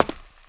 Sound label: ladder step; wob2 demo